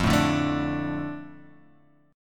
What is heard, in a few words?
D 5th F, D5/F chord; D5/F strummed; JGuitar